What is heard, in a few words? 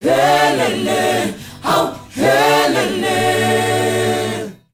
WEHLELE, MIX. CHOIR; Spectrasonics; Heart of Africa 2